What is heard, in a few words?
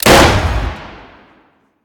Gun Fire Outside; Devour; The Town